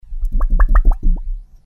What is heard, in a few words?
Citizen In; Bunnicula - Ghost Guard